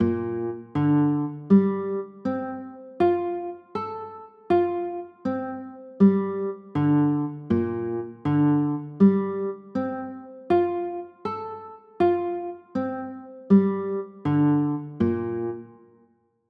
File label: b flat maj13